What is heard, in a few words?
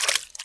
Splish; worms